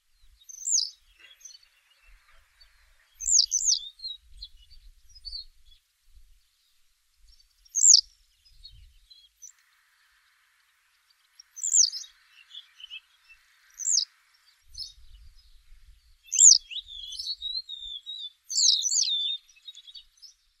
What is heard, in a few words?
black headed wagtail